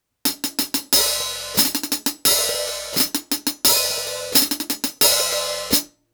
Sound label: the tbone MB85 Beta Fun Generation Wireless Hihat 15cm